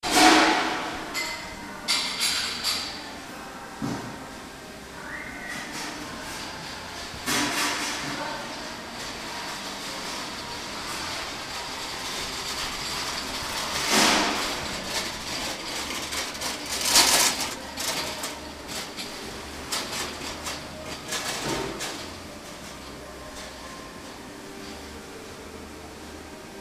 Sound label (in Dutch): geluiden uit hengelo